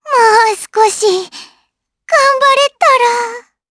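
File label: Vox Dead Japanese; Estelle; kingsraid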